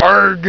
arhg

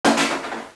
delete

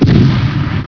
boom

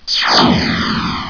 move it

